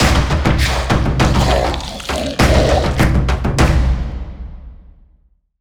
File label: Wave Completed; Conduit 2; Wii